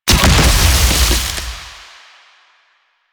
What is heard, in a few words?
grenade explosion; Enemies; Soldiers; Weapons; Warframe; Lasrian; Goonadier